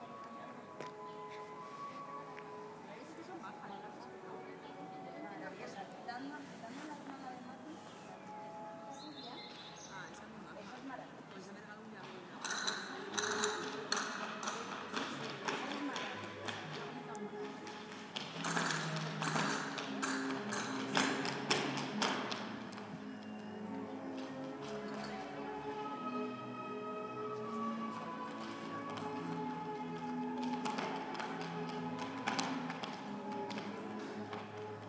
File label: flamenco